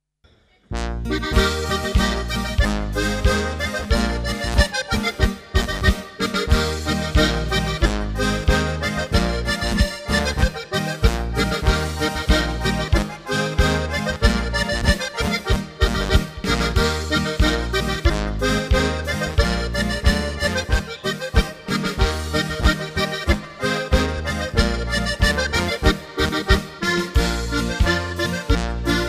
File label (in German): Blunz'n Boarischer